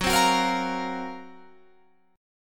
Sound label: F sharp Major 7th Flat 5th